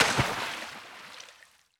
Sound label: frag physics bounce water; gmod; viper